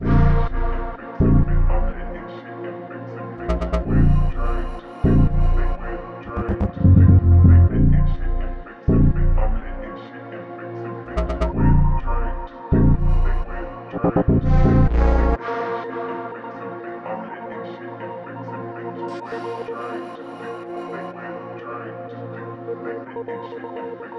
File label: 125 BPM A# Min; Melody Loops; Antidote; Cymatics